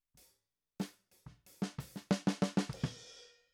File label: Snare